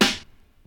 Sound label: old school rap snare drum sample g sharp key